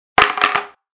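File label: falling sound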